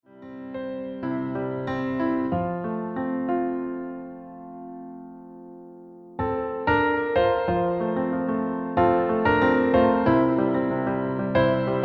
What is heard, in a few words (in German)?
Gute Reise F Solo